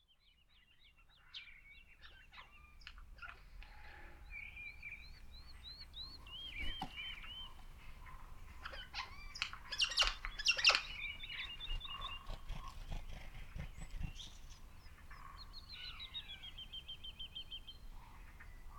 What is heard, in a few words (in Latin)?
Pica pica; SETE